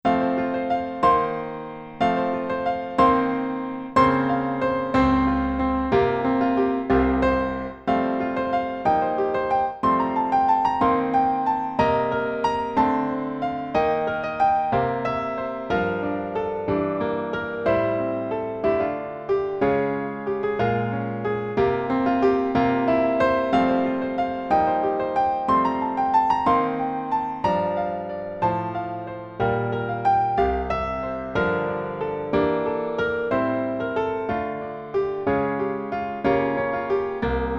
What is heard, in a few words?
break o sea accomp